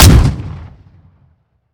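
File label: dpapa12 fire player, weapons; gmod; iw8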